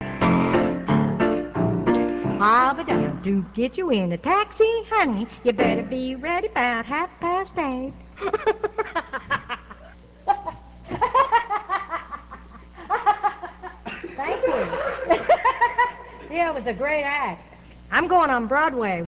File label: Mom laugh